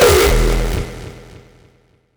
impact oneshot; GhostHack

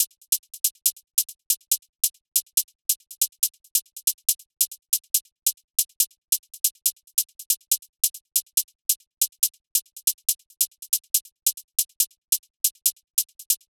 Drum Loop - 140 BPM Hihat, Drill; Loop Stems; Brute

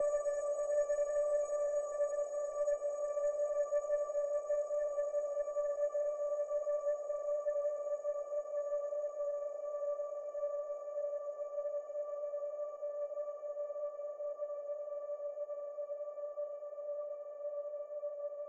Clouds Tonal Ambience D; trap; FX; Cymatics; Trap Starter Pack

